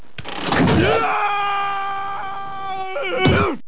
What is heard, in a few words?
Falling scream; Homer